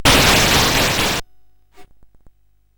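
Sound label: Nebulus, MOS 8580 SID chip music, games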